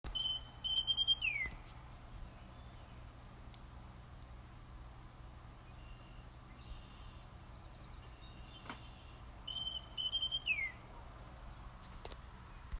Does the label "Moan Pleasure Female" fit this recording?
No